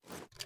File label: reload loop loadportend, sh; reloads; iw8; romeo870; gmod